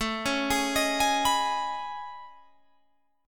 A Major Sharp 11th, Guitar, Open G

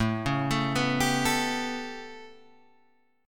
A 9th, down

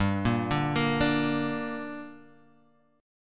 Bb 6th G

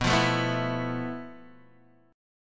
F Major 7th Flat 5th B, guitar chord

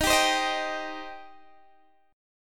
D sharp 6th, D sharp, down, Guitar, DADGAD

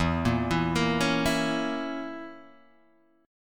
Db Minor 6th E

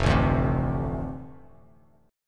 F 6th F Bass Standard; F6 strummed

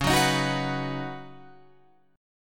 Db 9th Suspended 4th Db down Guitar Open F; strummed

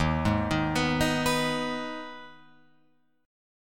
Eb Suspended 4th Sharp 5th Eb Guitar Open F; Ebsus4#5 arpeggiated; JGuitar

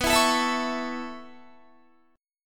B 9th Suspended 4th B down Guitar; strummed

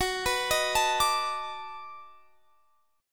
B 7th Sharp 9th

